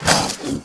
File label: alligator attack